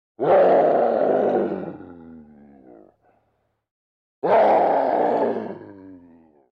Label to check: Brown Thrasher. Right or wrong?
Wrong